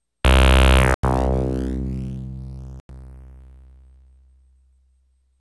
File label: OPL2